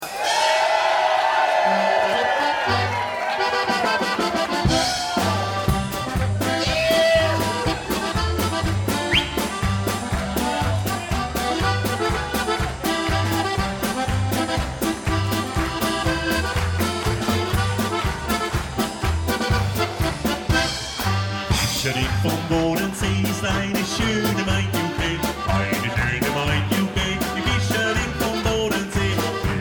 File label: German Band; Orlando German Band